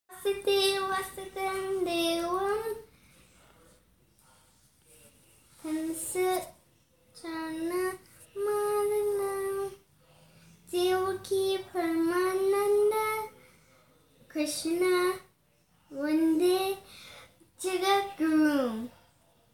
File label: Vasude-Vasutum-Devam Mantra Sung